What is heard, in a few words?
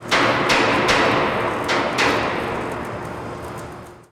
Hit, Earth & Steel